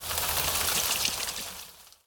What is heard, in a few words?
Water Pail